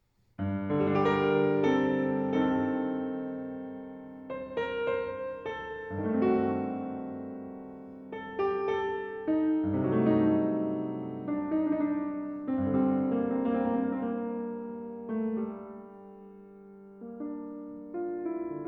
English Suite No. 3 in G minor BWV 808 IV. Sarabande